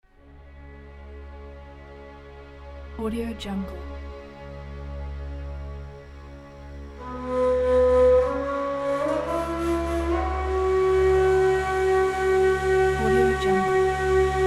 egyptian ney solo

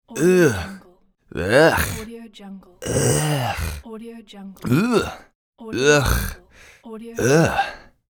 man voice disgusted

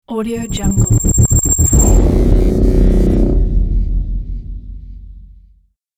scifi hit

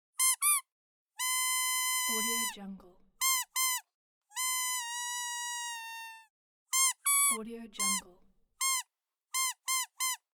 squeeze toy

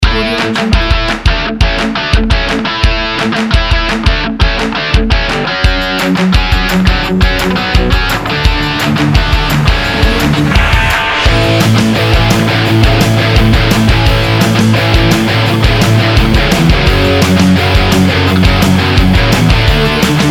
pop punk summer party

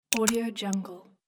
application tik

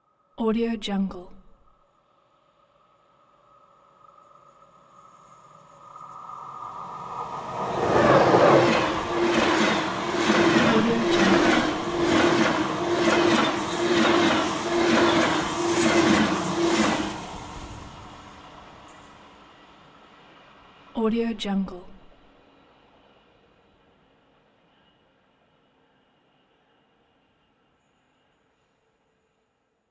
train passing by

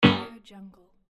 interface error

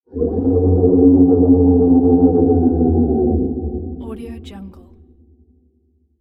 dragon voice

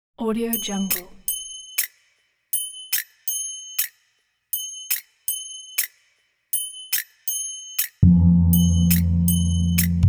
abstract gong loop; soundwood

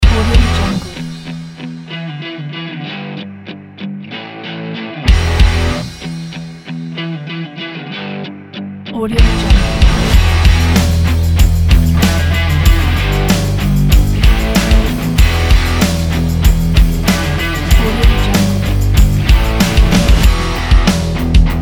sport rock

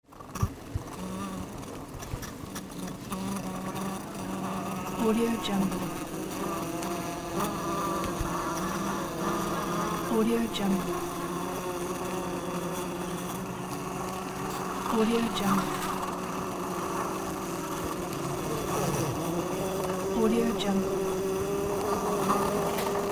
bee hive; n-audio

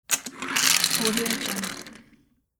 rolling plastic toy car

